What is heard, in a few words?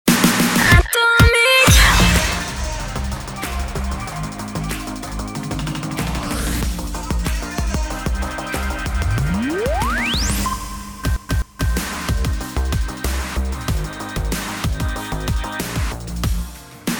Jingle; Atomic Cage